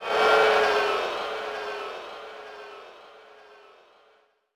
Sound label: boo; HeavenStudio; dj School